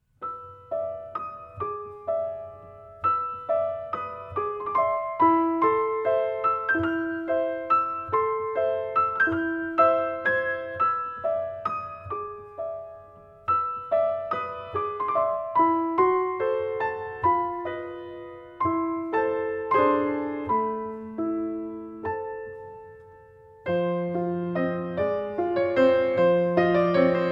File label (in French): les oiseaux metalliques, piano